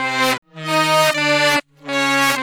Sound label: Back Effect Sect, Effected Horn Section, Jazz; Sonic Foundry (Sony Creative Software); Jazz Solos and Sections